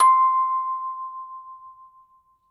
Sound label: MusicBox C5; Synthetic's Serum Collection; Aubit; ODESSA Vol. 3